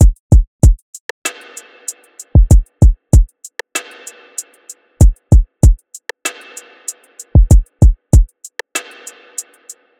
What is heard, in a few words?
Drum Loop 96 BPM; Cavern; Cymatics; Vibes II Drum Loop Collection